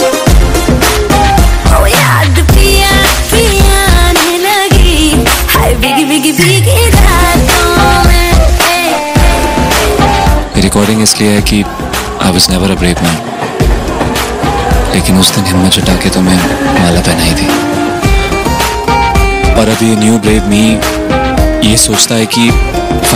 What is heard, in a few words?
hindi music ringtone, bollywood; bollywood ringtones